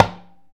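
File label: percussion tabla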